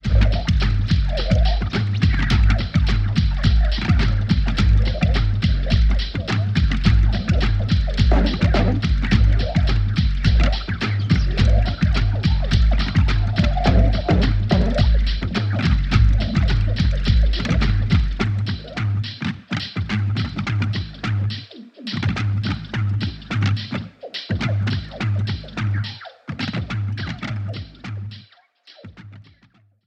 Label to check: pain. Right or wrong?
Wrong